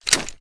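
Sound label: pistol 1911 drop; gmod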